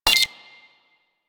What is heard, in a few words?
Credit Pick Up